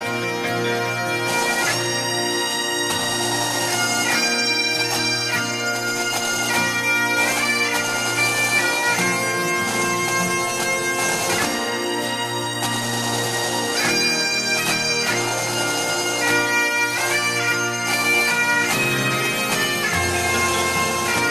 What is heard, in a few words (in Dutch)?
Doedelzak